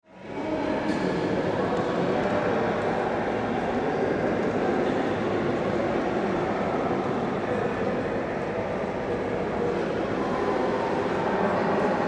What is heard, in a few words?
Ambience Train Station Heavy Reverb; Train Stations; Backgrounds